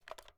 inspect; gmod; viper

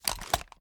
sm uzulu reload empty scope start; reloads; iw8; gmod

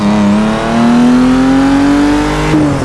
second, vehicles; gmod; tdmcars; for taurus 13

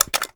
ar reload fast empty dmr mag out; weapons; gmod; viper; scharlie

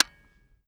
fire disconnector; gmod; viper

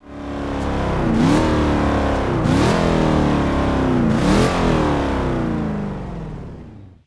throttle off, vehicles; gmod; tdmcars; pon transam

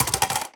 phys mag drop large drum concrete, magazine drops

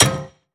frag physics bounce metal hard; gmod; viper

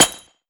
frag physics bounce concrete hard; gmod; viper

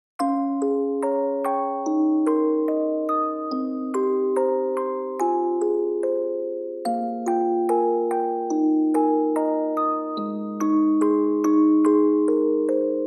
MusicBox; nekopara

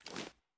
ads up, reloads; gmod

